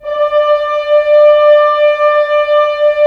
Horns; Roland - LA Composer vol. 4

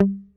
YAM MUTE G 3, Bass; Masterbits CLIMAX 7; PATITUCCI BS

